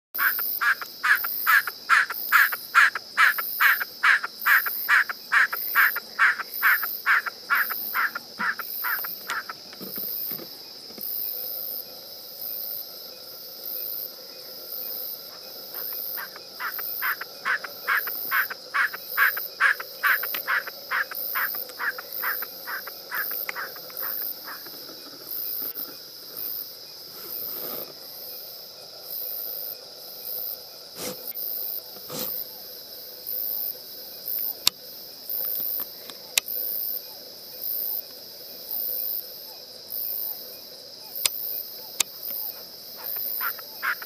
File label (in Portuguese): Narceja